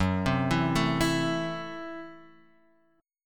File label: F sharp Minor 7th Flat 5th; F#m7b5 Chord {2 3 2 2 X 0} arpeggiated